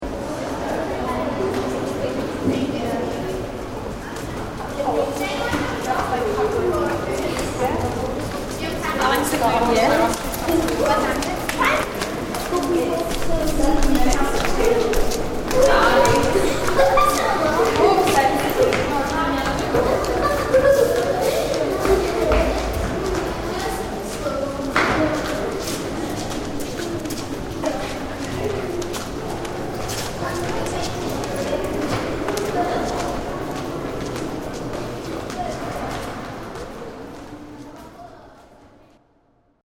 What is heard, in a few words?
Ambiance inside Liverpool Anglican Cathedral with passing children; Church Ambiance; Churches